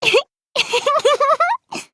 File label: laugh; monaca